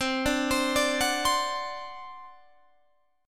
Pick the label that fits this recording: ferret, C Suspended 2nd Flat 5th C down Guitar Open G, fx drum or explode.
C Suspended 2nd Flat 5th C down Guitar Open G